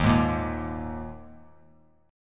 D sharp Suspended 4th; D#sus4 strummed; JGuitar

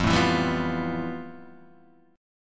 F Major Sharp 11th

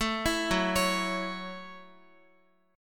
F sharp Minor 7th F sharp, Guitar; F#m7 arpeggiated; x,x,7,9,6,8

